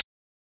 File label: button click